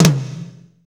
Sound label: TOM, Roland Kits; Northstar - Drumscapes Roland